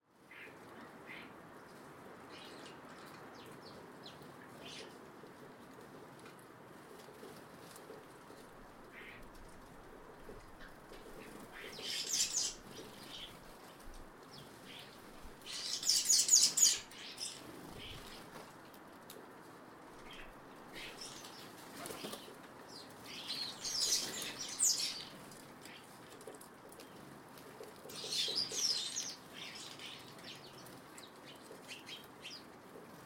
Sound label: squabbling birds in the backyard